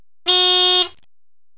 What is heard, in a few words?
stock horn